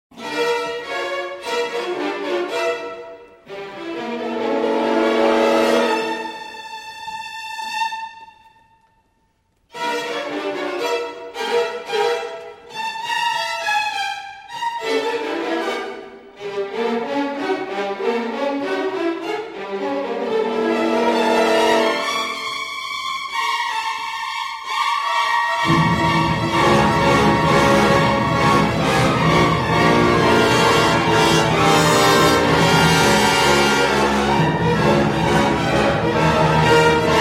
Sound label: Requiem, Symphony orchestra; Dies Irae; Part 2